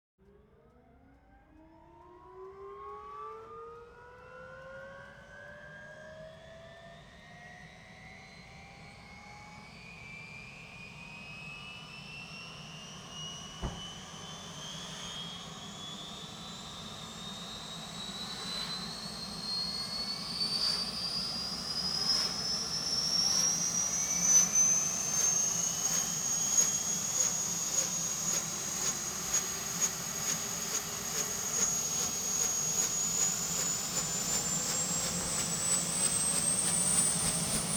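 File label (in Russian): вертолет Bell UH-1D взлетает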